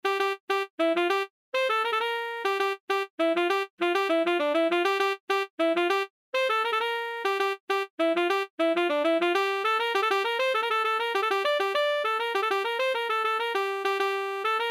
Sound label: Saxophone